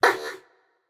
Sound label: ouch, voicepack; smite; jorm